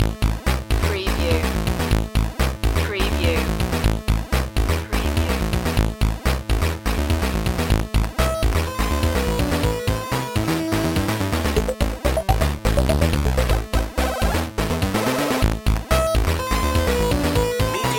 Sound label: retro video game hotfix loop